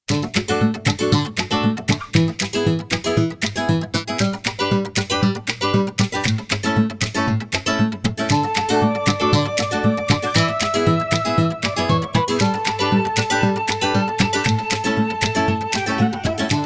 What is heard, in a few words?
Fun Guitar; music for kids videos